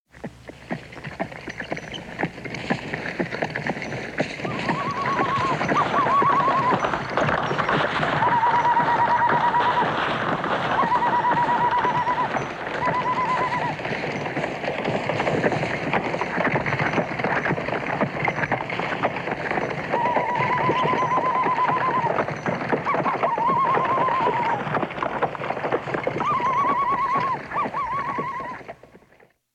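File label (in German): Kampfszenen Indianerüberfall Auf Postkutsche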